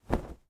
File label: FABRIC Movement Fast mono; adams venture origins